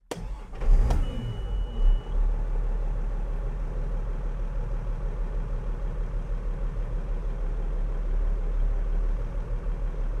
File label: Farm Tractor Magnum 7250 ONBOARD Driving Sequence Constant RPMs INTERIOR; Dramatic Cat